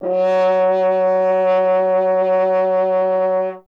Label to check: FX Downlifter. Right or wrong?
Wrong